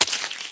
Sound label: gore; casualtiesunknown